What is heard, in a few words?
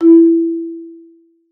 MARM E4, MARIMBA; Xsample VOL-4